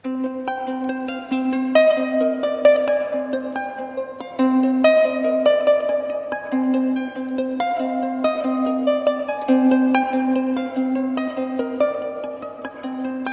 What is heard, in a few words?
Uke; Profondo Rosso